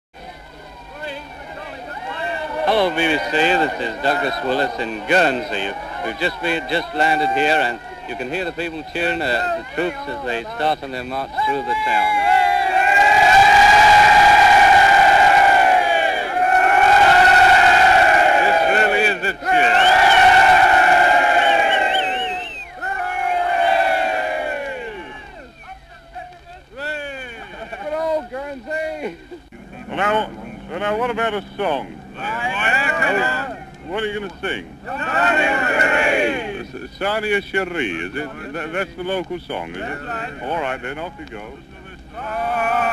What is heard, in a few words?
Sung; Sarnia Cherie; At Liberation 9th May 1945